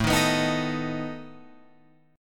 A Suspended 2nd Flat 5th A down Guitar Open F; Asus2b5 strummed

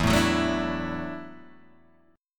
E 9th Suspended 4th E down Guitar Open G

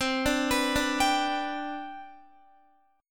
C Major 7th Suspended 2nd C down Guitar, chord; CM7sus2 arpeggiated; JGuitar

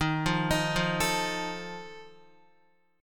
D sharp Suspended 2nd Flat 5th D sharp, Guitar; arpeggiated; chord; x,6,5,8,5,5; JGuitar

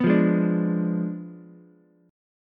D Diminished 7th D down Ukulele; Ddim7 strummed; JGuitar

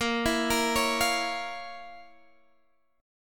F Major 7th Suspended 4th Sharp 5th Bb

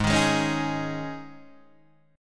Eb 7th Suspended 4th; Eb7sus4/Ab strummed

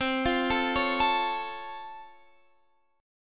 C sharp Augmented Major 7th, C

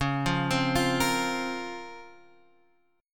Db Major 13th, Guitar Half Step Down

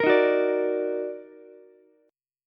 D sharp Minor Major 7th, Ukulele; strummed

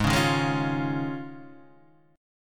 Eb 7th Suspended 2nd Suspended 4th Ab

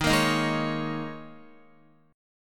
Eb 7th Suspended 4th, Guitar; Eb7sus4; strummed; x,6,8,8,8,8; JGuitar

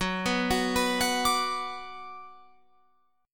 Gb Suspended 4th Sharp 5th, Guitar, Open F; Gbsus4#5 arpeggiated; 18,18,18,18,21,21

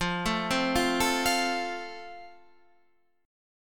F Major Guitar Half Step Down

